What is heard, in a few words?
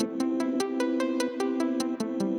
Nice Arp Loop; BB 2HTC Samples Vol 4 Addendum